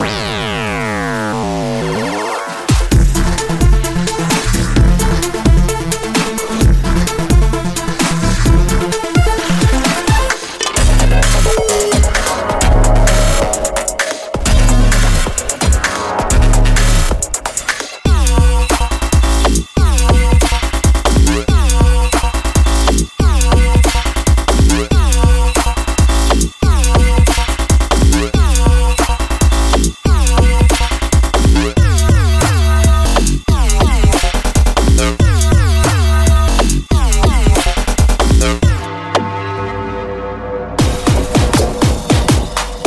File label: Cyber Electronic